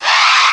skid

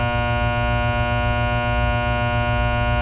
laser fence hum; Gunlok; environ

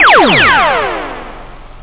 RAYGUN

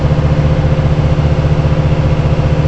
MOTOR, Slot Car

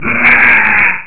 growling man

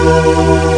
Blow

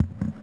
step; dungeonmaster

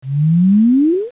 Rising

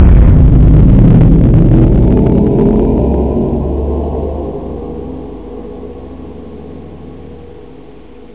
GONG

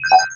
BLEEP; Gadgets; XCOM

